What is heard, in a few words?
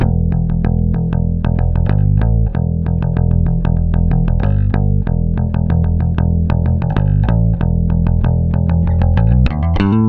BALBOA 95, Bass Grooves; USB Soundscan vol.30; AKAI